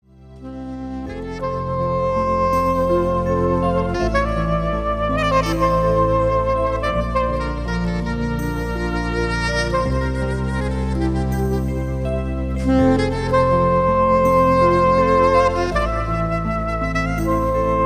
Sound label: Saxophone